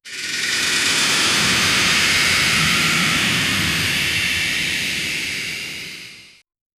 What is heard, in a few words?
transforming, machines; iron man